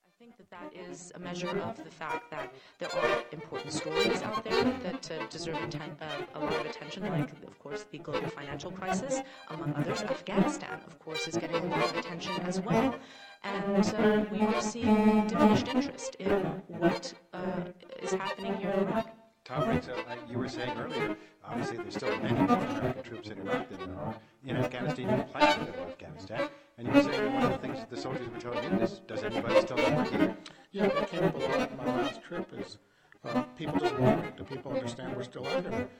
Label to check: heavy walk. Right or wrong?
Wrong